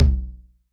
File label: Tom, Yamaha DJX 2B